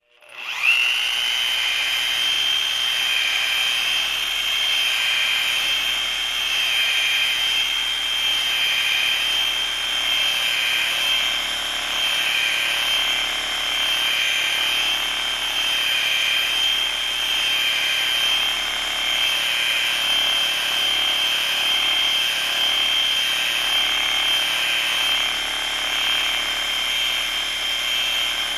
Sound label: Electric Hand Driller Boring Holes